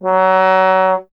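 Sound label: bass trombone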